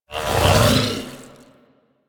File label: Dodge Cast End; Warframe; Warframes; Pacifist